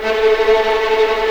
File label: A3 TREM, VLNS TREMOLO, String; East West; The Ultimate String Collection